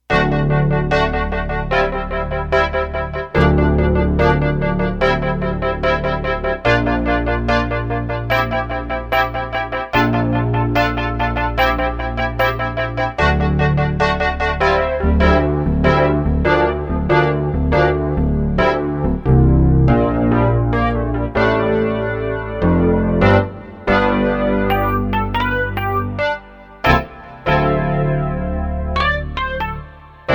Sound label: piano rhodes chorused; dss1 demos